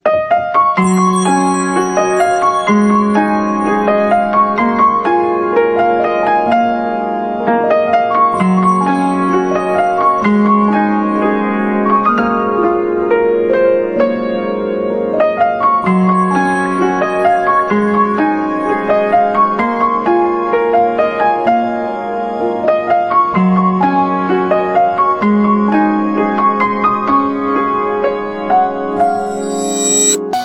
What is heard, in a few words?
piano version